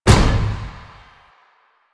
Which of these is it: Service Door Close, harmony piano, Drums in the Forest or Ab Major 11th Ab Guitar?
Service Door Close